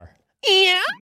yeaaaah

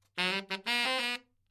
Sax Text

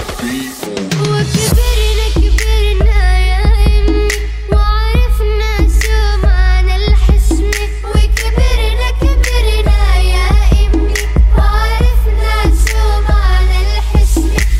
Best Arabic Trap

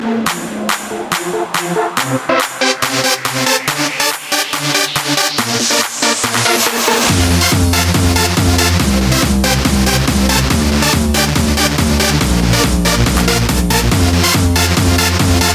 Jumpstyle

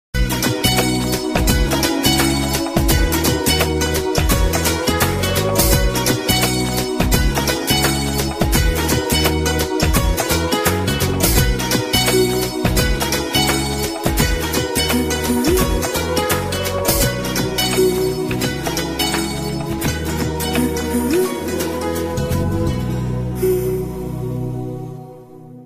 Nice Music

